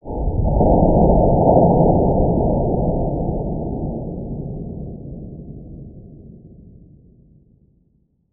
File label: misc amb